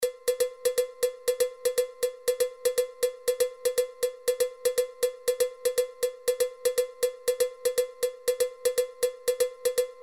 Cinquillo Cowbell

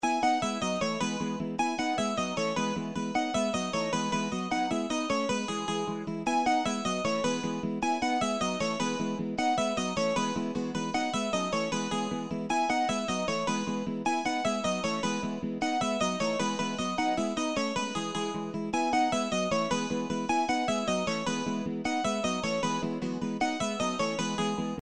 Soukous Guitars All

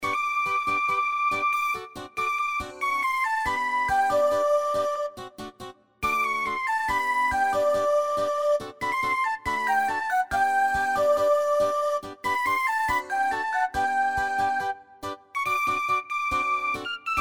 Kwela Guitars Flute

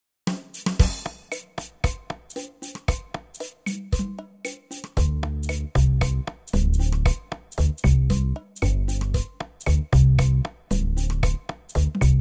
Bass Drums

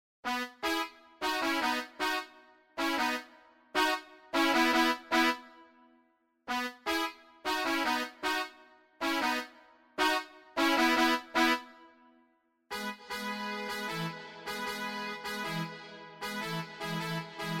Soukous Brass

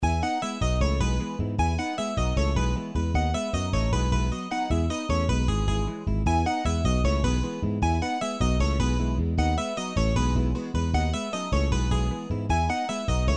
Soukous Guitars Bass

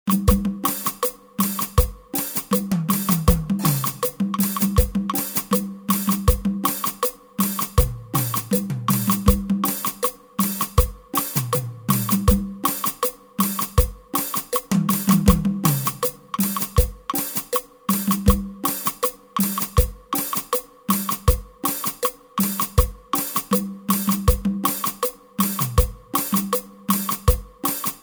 Cumbia Rhythm

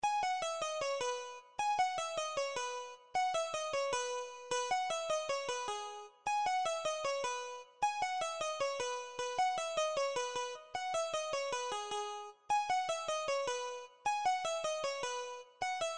Soukous Clean

